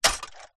science fiction robot android metal single footstep